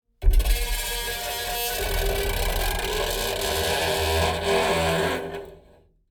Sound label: Dulcimer strings tortured texture